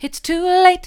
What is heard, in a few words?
it's too late soft, vocal